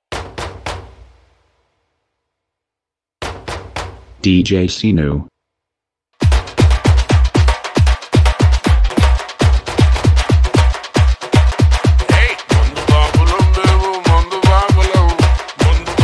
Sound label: Bass Mix